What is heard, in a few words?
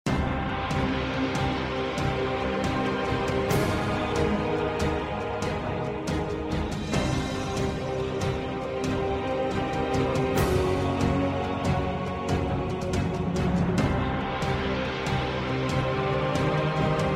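Arena Background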